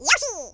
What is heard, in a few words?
yahoo; yoshi; SM64 CO-OP